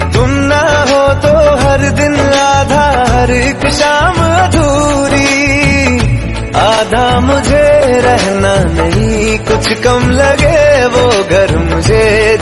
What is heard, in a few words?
Elder Sister Birthday Song In Hindi